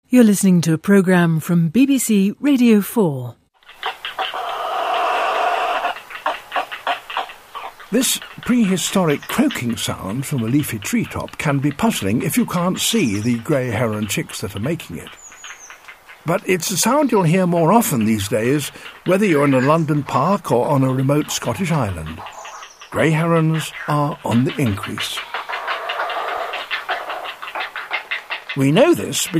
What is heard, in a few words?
heron